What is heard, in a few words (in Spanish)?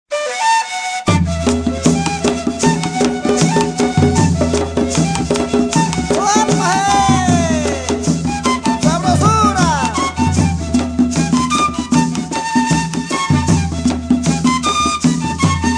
ejemplo de ritmo lento